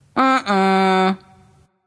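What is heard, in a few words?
disagree, female; smite; voicepack; hera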